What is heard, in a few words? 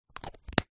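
sounds of hairspray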